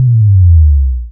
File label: Bass Drop; osu